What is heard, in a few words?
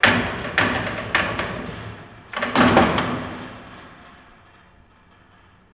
Jail Door Rattling, jail & prison doors